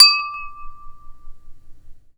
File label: GLASS DING, percussive